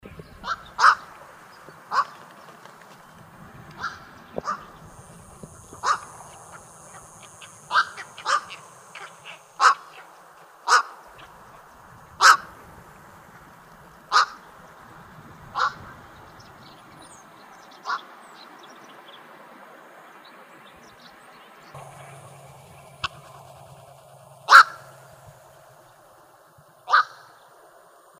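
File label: Black-crowned Night Heron, Large Wading Birds; BCNH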